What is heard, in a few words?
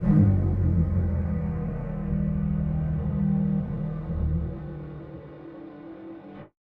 Malfunction Synth, malfunctioning synth samples